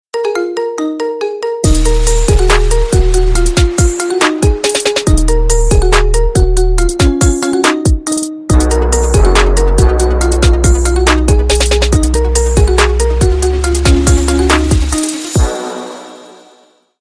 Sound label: marimba remix